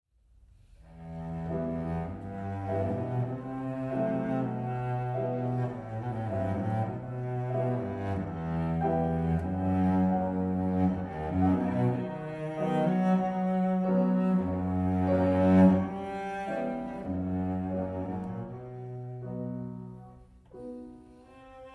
Cello Sonata; No.1, Op.38; Brahms, Johannes

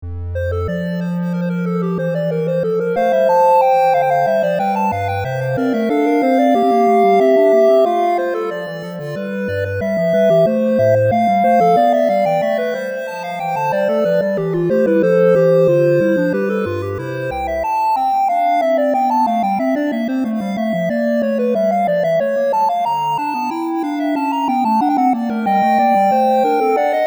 for synths; 15 Sinfonias, BWV 787-801; Bach, Johann Sebastian

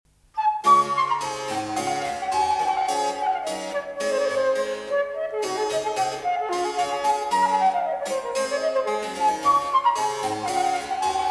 Transverse Flute Presto; VINCI; Sonata

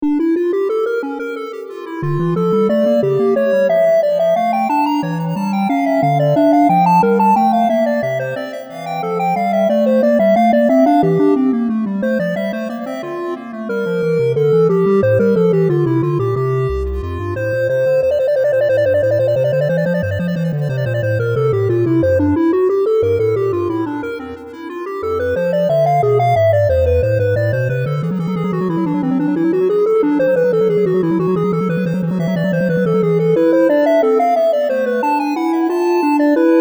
bwv 775 for synths

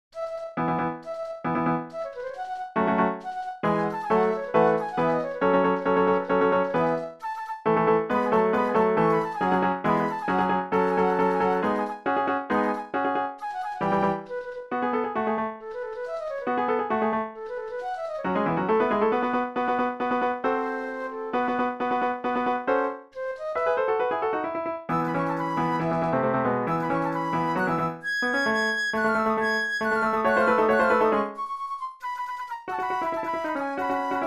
flute; Short Pieces for Flute and Piano 2; Li, Cheng-Shiun

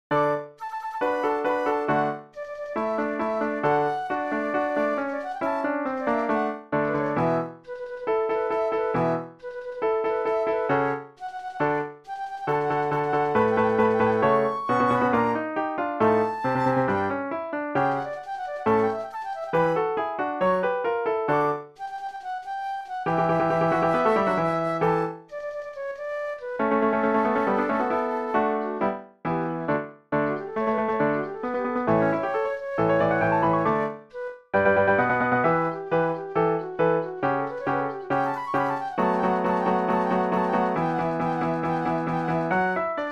flute; Short Pieces for Flute and Piano 2; Li, Cheng-Shiun

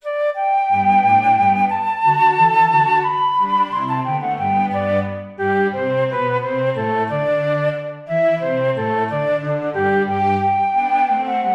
Baryton Trio in D major, fl vla clo; Haydn; Hob.XI:34

